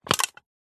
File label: soda can tin empty step on crush light; foley; zapsplat